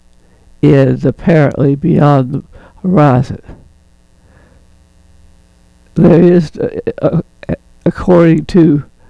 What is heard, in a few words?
Electronic interference